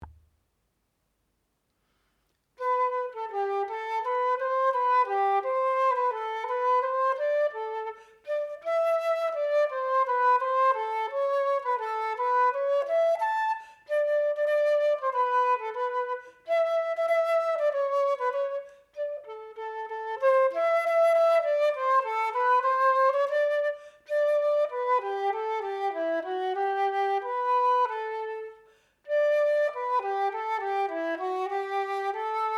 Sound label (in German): Querflöte